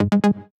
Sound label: synth quiet, message Received Sounds; Session iOS